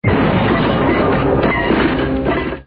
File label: Crash Large